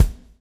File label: SWNG